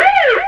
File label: alarm; Ueberschall; Techno Trance Essentials